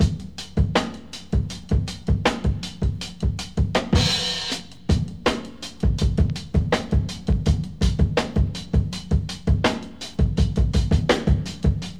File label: Bonus Beat